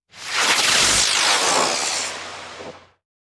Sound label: Fireworks Spinners